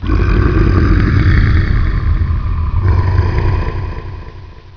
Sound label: attack